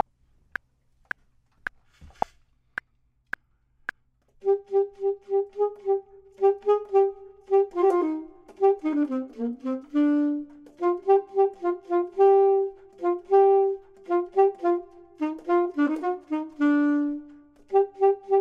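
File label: bottom, Saxophone and Clarinet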